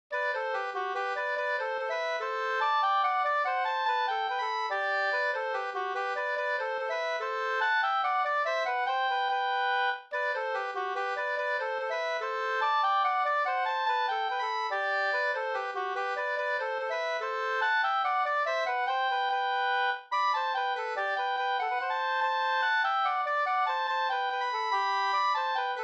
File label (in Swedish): slängpolska; Torp